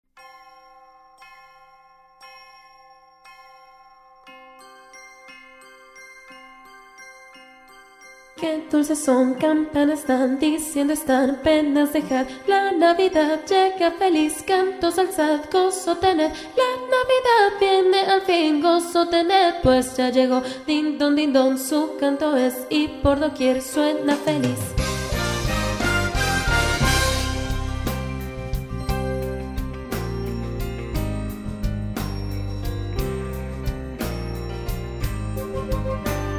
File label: Soprano; Canto de las Campanas